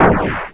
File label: missile fire; weapon